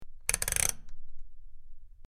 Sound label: Rotating Switch Clic